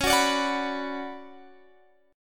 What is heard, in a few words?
D Major 13th C sharp, chord